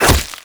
attack hit, zombie; creatures

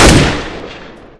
m4a1 unsil; weapons; Counter Strike Model Pack; CS:GO

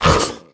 grunt soft, creatures; vox

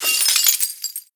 debris glass, bullet impact debris

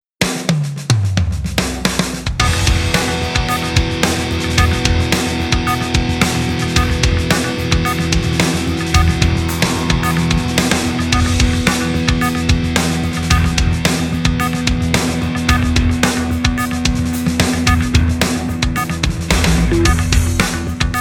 start action, music

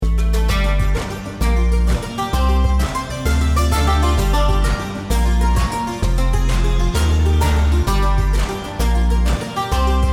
start round

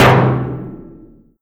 impact, metal barrel; physics; props

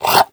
breath in, headcrab

